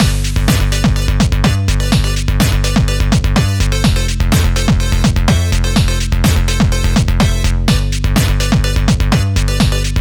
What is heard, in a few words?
Chiptune; Chiptune Music Pack Vol. 4